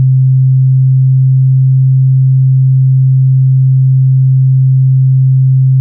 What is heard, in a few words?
ir Flux Up; molecules and light; greenhouse effect